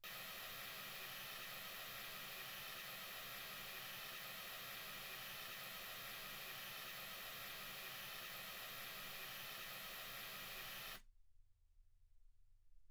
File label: mls 48kHz